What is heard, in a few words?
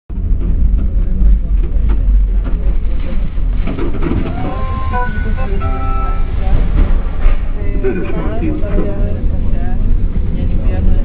train